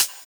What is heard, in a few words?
Hhat, HiHat; Equipped Music; Loungin House